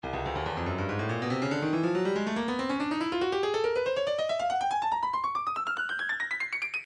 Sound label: breakbeat midi, 141 bpm; Stylus